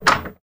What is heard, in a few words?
Latch Release; latches & bolts